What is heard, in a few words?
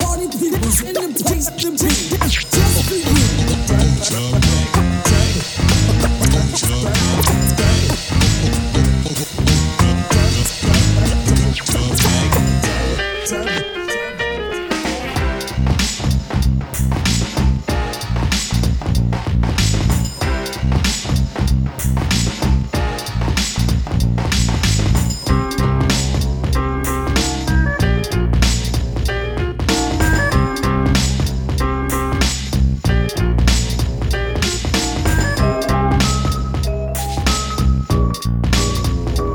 Instrumental